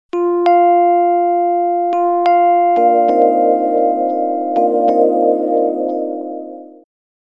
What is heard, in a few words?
Simple Tone